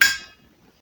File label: Metal